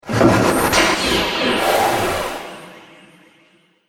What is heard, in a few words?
Starship Heatseeker; Spacecraft